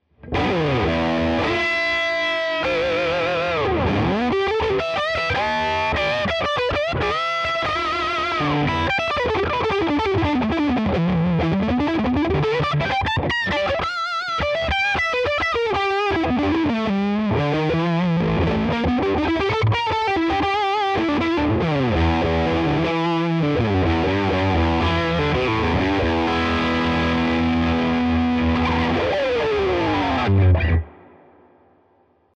Licks HiGain

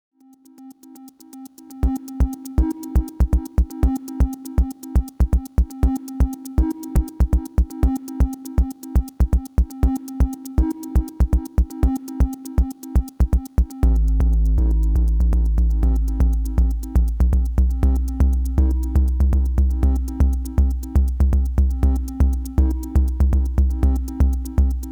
Bassdrum Tweaking; 1010music nanobox razzmatazz

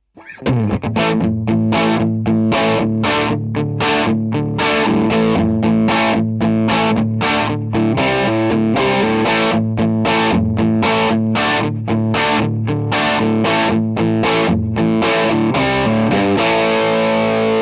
Low Gain Riff

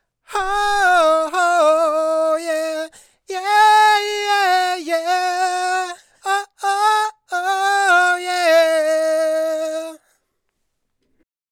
Vocals

